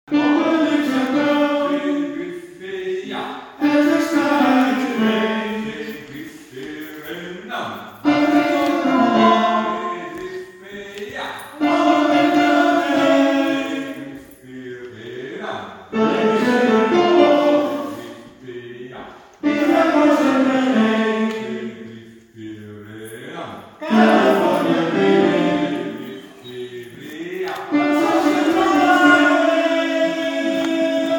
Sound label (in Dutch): Tenor Bas